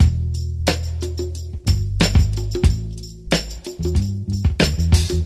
full, Loops Phonky

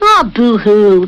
"ah boo hoo"; Voice; ooh ahh

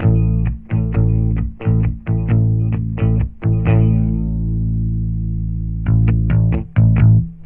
guitar, Phonky Loops

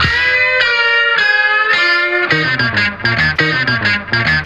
guitar, Loops, Phonky

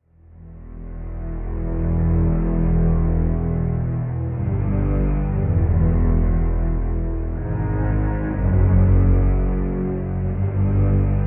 strings ominous prescence, Sad; Loops

